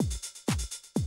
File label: Classic DrumLoops, 125